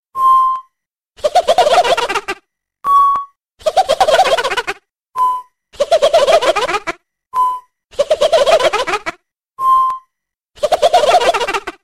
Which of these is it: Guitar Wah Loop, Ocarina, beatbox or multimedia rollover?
Ocarina